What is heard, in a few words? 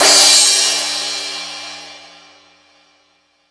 Ambient Rock, E-MU Pro-Cussion